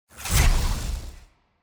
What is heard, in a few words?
Orbital Hammer Start Attack; TANK; 3Souls